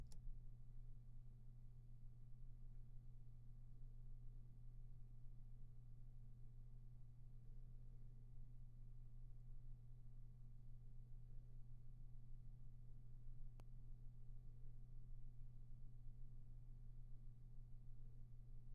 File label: room tone